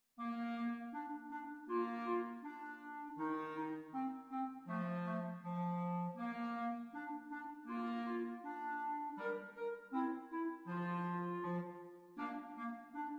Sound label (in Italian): quartetti; 10 easy quartet for clarinets